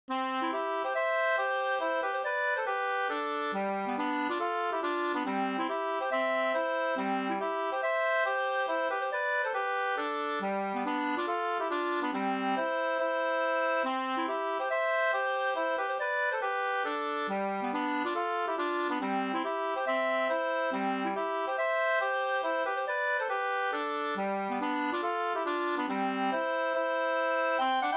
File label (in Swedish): schottis; Grona boken